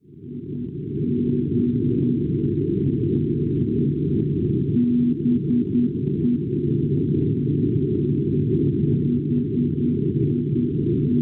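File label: non-directional beacon 318 kHz; Bordeaux; Francia; Schwarzsee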